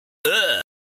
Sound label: ugh; fnf; tankman